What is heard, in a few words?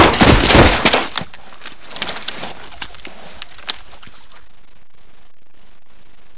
body fall